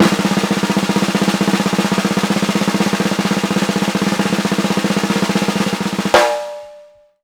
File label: ROLL, BLAK TAMA; Sampleheads; New York City Drumworks VOL-1; SP BLAK TAMA